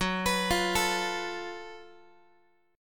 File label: Gb Minor Major 7th Double Flat 5th Gb Guitar; GbmM7bb5 arpeggiated; x,14,14,15,13,x; 4A,4E,5A,5D,5G#,6C#